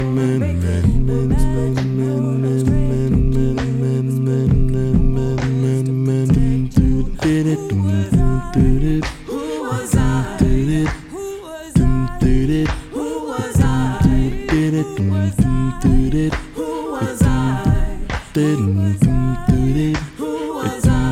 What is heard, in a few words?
practice track bass